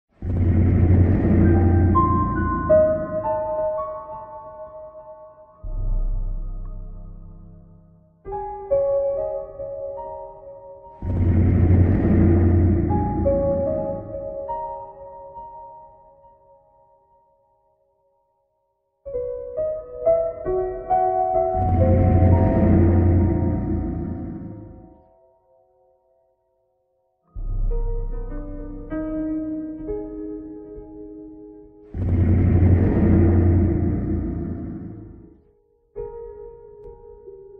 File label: Music Cellar